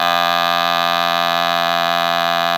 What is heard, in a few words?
bordun, dudy; SoundBytes - Early Patches